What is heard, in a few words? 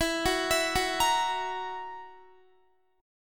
E Suspended 2nd Flat 5th, Guitar; Esus2b5; arpeggiated; x,19,18,21,18,18